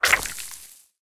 SFX Fight Attack Spittle; mighty party 2017 gamerip pc ios android

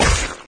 Gib Drop

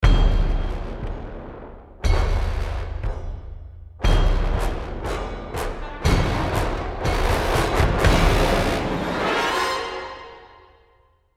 Wall Of Brass

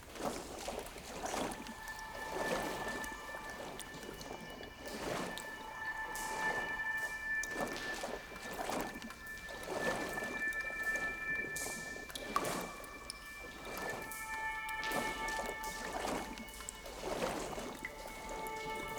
Cutscene Loop; We Are the Dwarves

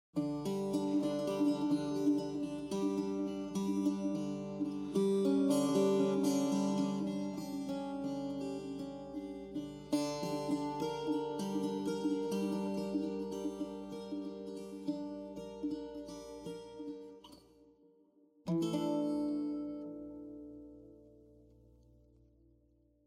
Acoustic Solo

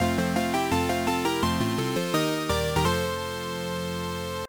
Match Start; Wimbledon Championship Tennis; Genesis

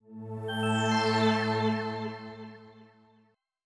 portal level exit; Space Otter Charlie

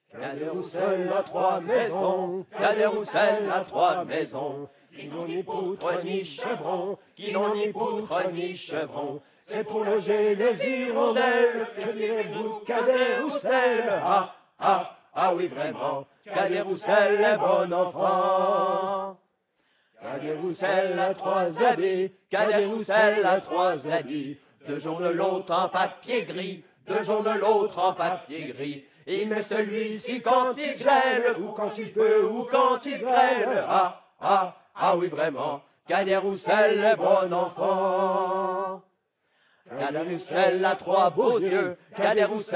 Choir; Assassin's Creed Unity

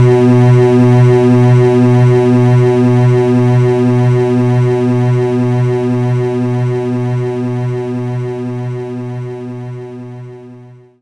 Strings B2; knights try

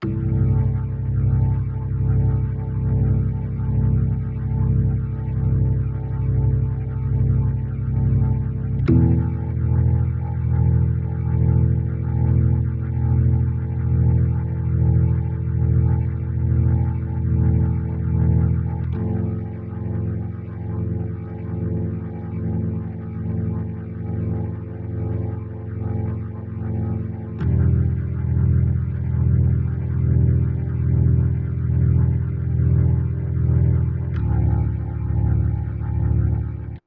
Dark Throb; Fault Milestone One

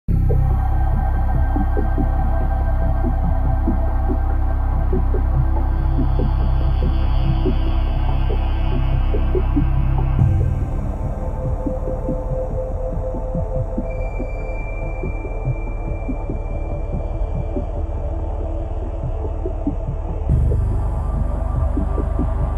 Space Ambience; Spacebourne

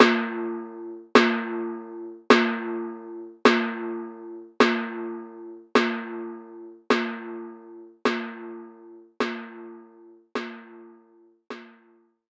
Timpani Hard; knights try

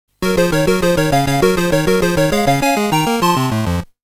Round Clear; Monster World Complete Collection; Monster Land

